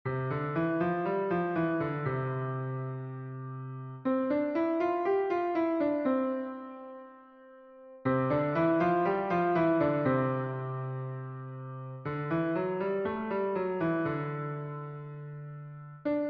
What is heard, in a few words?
major five finger patterns